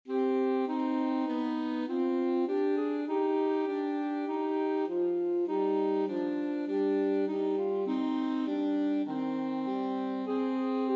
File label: alto saxophone duet